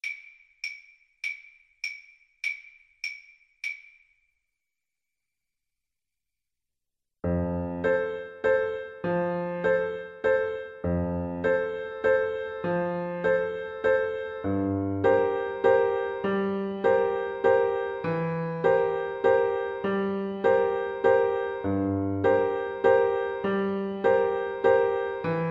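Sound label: karaoke, Viola; Strauss II,Johann - Blue Danube Waltz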